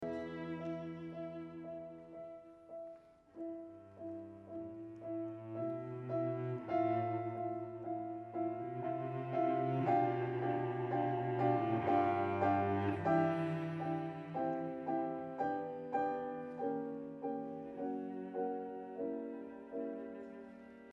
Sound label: arpeggione